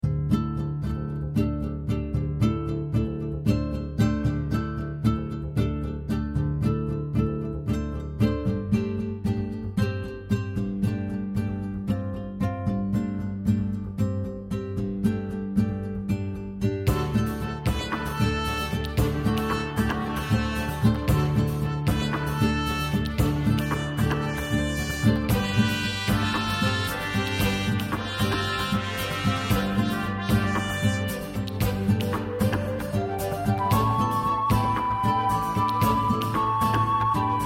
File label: music; New Yankee in King Arthur's Court